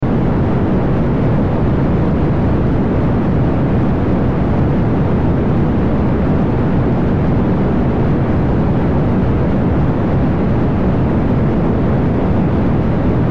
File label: Flame Blow Torch, Flame Blow Torch Constant Industry Tool Loop; Flames; Flame Sound Effects